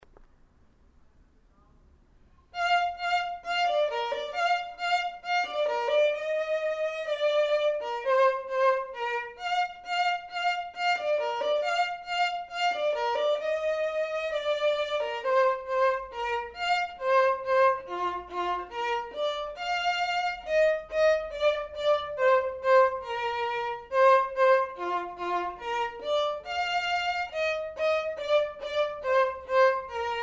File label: vln perf